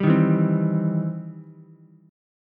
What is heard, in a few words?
E Minor Major 7th Sharp 5th, E, down, Ukulele; EmM7#5 strummed